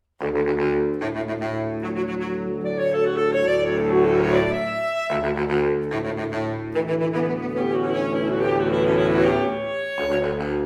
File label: fanfare; dentistry; centennial